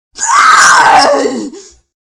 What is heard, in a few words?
death